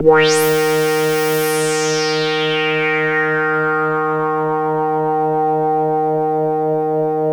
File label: DRONE D#3; Best Service Alpha Dance vol.2